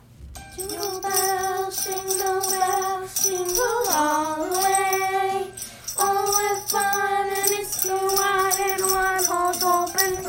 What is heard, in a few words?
Jingle Bells